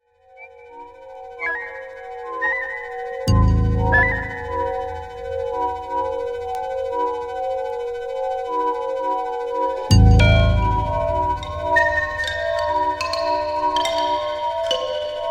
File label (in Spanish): musica electroacustica espanola